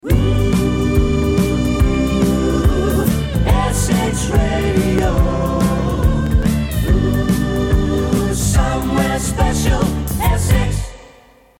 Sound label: harmony